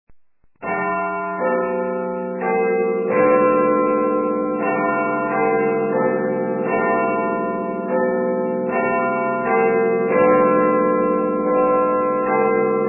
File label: big ben